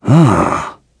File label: Vox think; en; voices; heroes; Clause ice; kings raid